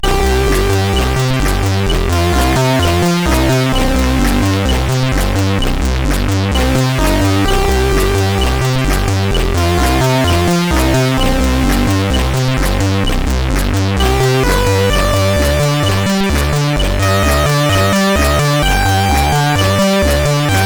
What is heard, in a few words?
tune, sid 8580R5